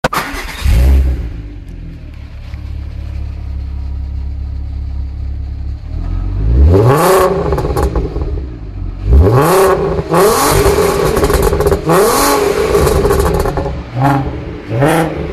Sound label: Vw R32 V6